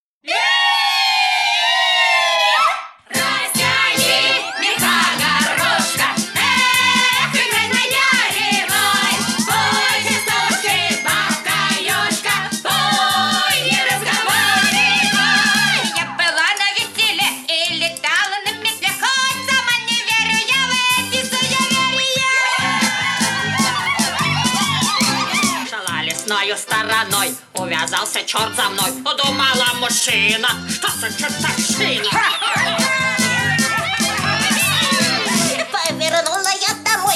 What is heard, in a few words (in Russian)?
Частушки Бабок Ёжек